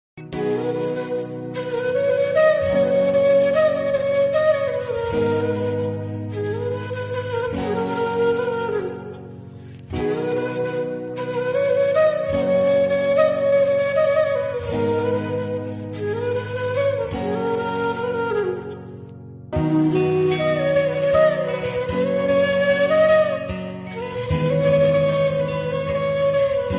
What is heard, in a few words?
Background Music; Padi Padi leche manasu; Telugu